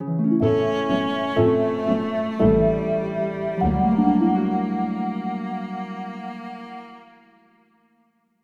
Game Over; Skyward Sword Original Soundtrack

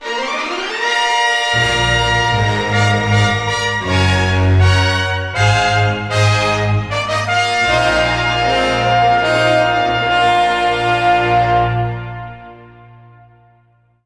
Upgraded Sword; Skyward Sword Original Soundtrack

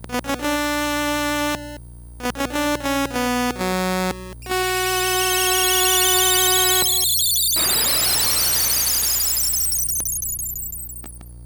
Conch Horn; links awakening original soundtrack

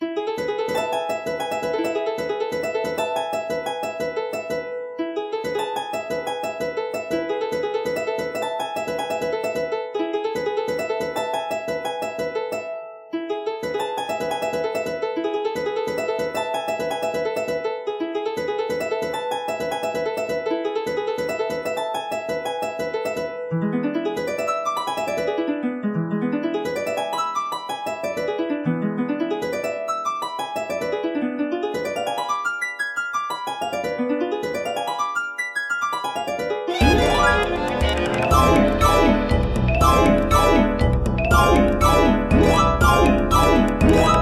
Power (With Harp); Skyward Sword Original Soundtrack

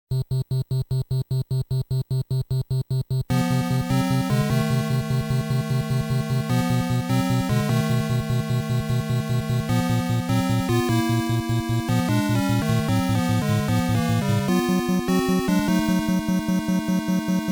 BGM; Death Mountain; zelda the music

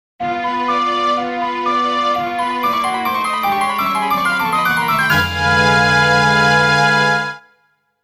Gale Warp; Majoras Mask Original Soundtrack

